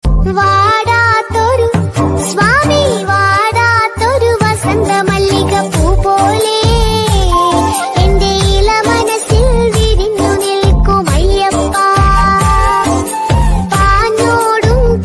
Ayyappa Ringtones Malayalam

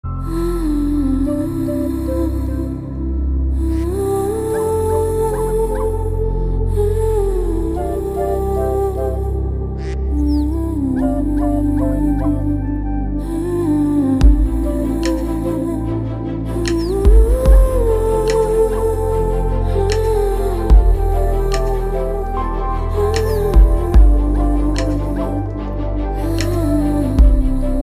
Sad Ringtone; Robot 2.0

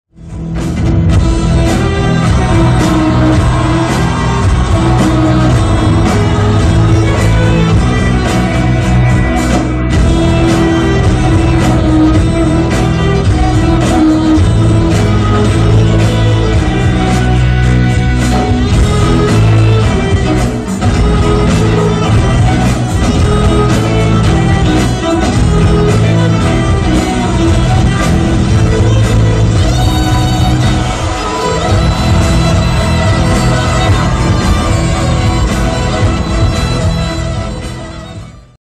violin ringtone

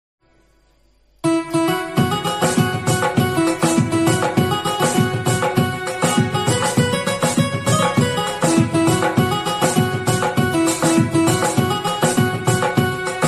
Diwali Instrumental Ringtone

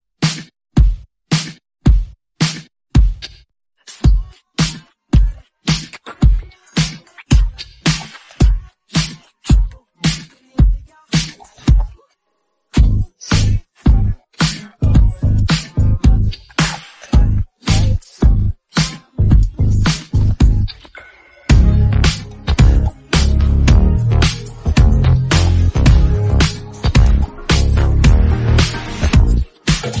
Instrumental Ringtone

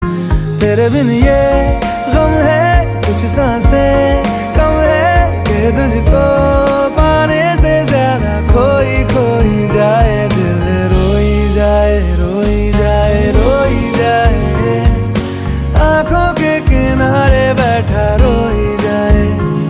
Sad Hindi Song

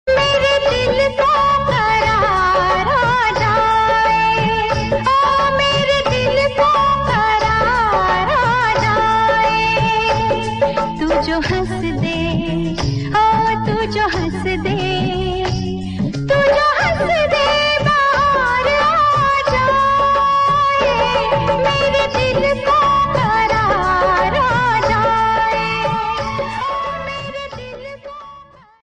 Hindi romantic songs Ringtone

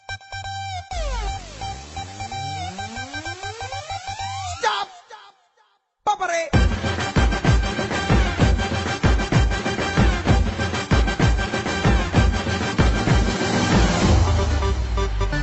Anthem; ICC T20 World Cup 2021

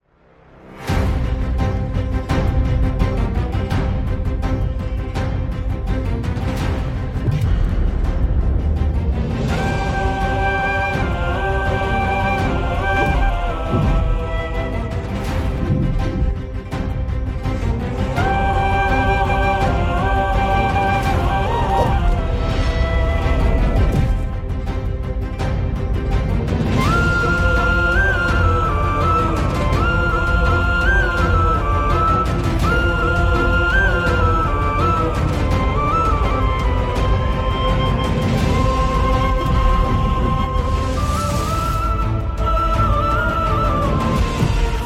Sad Emotional Love BGM Ringtone; Pushpa

